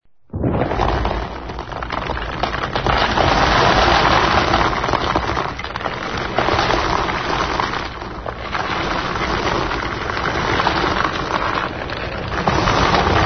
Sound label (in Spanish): TRITURACION; Triturar; misterio